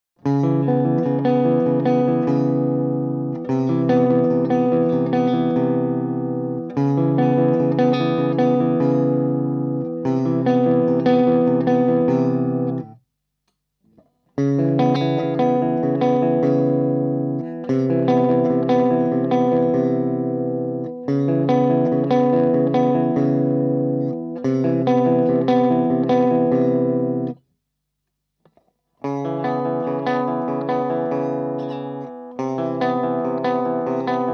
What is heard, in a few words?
Clean